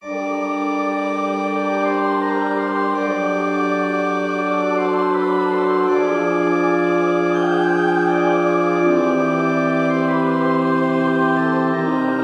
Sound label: Pray Organ loop